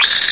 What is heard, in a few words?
activate, probe